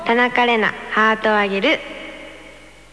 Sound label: jingle